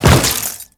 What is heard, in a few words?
Grineer Step Flesh, Footsteps; Enemies; Warframe